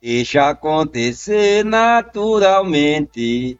cantando

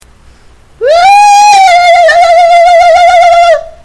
falsete

